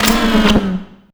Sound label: CYBER LIFT, CYBER TOYS; Spectrasonic Distorted Reality 1